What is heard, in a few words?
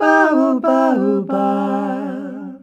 BAUUBAUUBA, males; Best Service; Hyper Dance